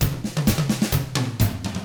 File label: 127 Drums